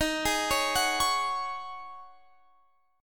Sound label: C sharp Suspended 2nd Suspended 4th D sharp, chord